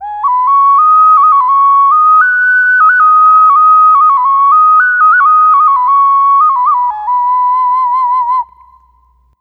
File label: Hi Occarina; Ambient; Clean Flutes